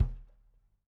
room Kick, drum

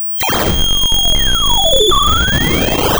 pop

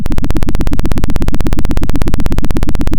Sound of a helicopter

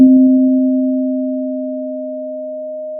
Sound of a church bell

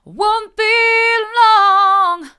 wont be long hard, vocal

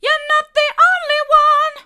mid, vocal

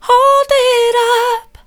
hold it up soft, vocal

